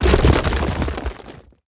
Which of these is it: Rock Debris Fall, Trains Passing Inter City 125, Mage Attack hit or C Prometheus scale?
Rock Debris Fall